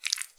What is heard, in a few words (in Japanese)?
膣外射精